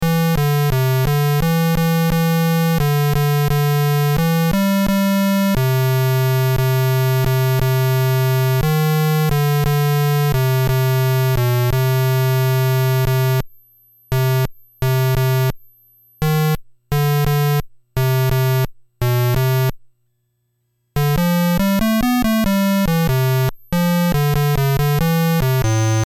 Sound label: Music Medley; Lesson Version; BASIC Manual